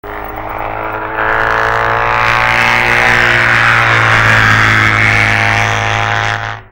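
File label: Triumph, motorcycle; 1968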